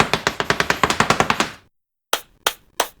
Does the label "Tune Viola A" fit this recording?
No